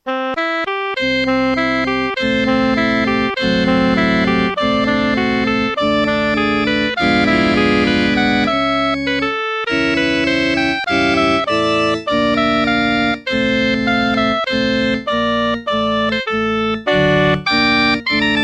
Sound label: Jazz Classic Intro